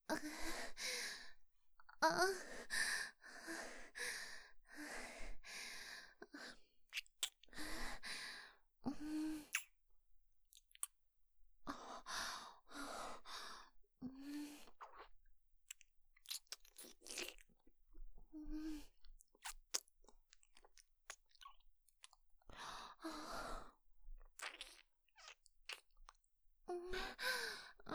キス; 【マゾハーレム】

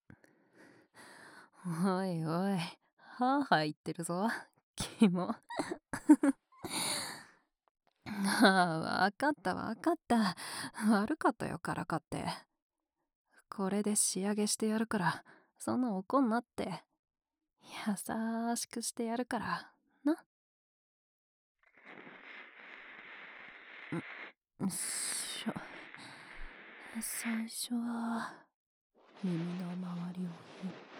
【右】梵天(終), 耳かき, ASMR

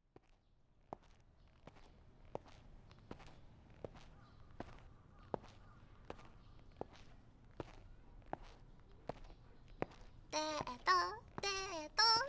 公園で耳かき耳舐め; 姫野さん; これ以上!いかせないでよ姫野さん!! 姫野のおっぱいとふとももでいかせちゃいますね?

